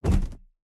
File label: enemy duck fly flap